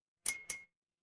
glass clink

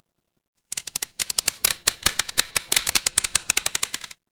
mechanical gear

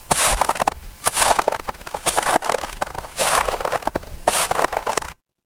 footsteps snow

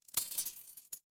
glass shatter

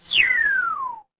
cartoon slide whistle

